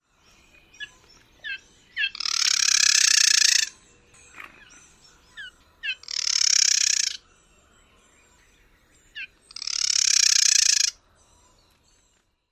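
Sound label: Carolina Wren whines and female chatter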